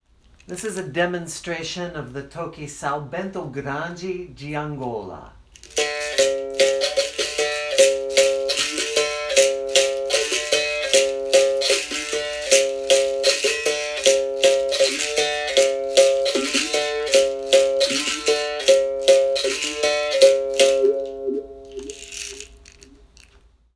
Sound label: Sao Bento Grande de Angola demonstration; Click below to listen to me demonstrating the São Bento Grande de Angola toque; Capoeira Music Practice Material